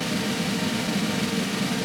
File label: Vintage Snare Drum